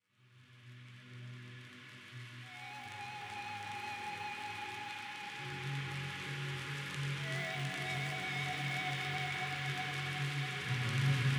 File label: Fluid Flow Pads